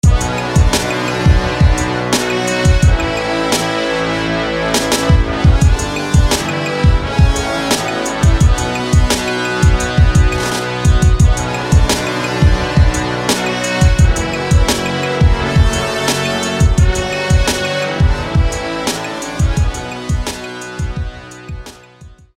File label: Brass; Brass of Vibrance; SFB FX